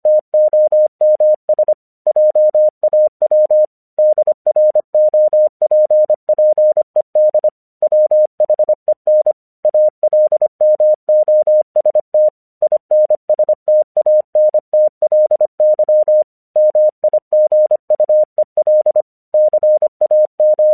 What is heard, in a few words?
English 25wpm